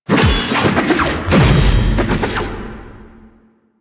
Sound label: Riposte Polearm Finisher Foley; Enemies; Finishers; Drifter; Warframe; Duviri; Dax